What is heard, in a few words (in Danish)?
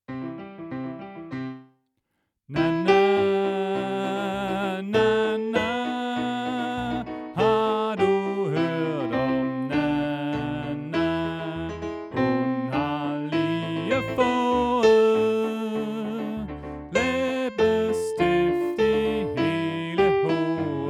Sopran; oevefiler